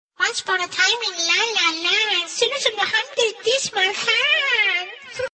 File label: Funny Child